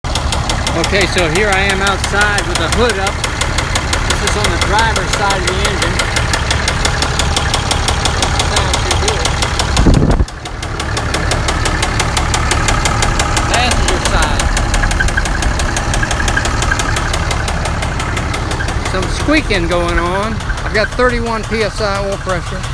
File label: 6.7 liter dodge ram 3500 engine noise engine knock tap; 4th gen engine drivetrain 2010 up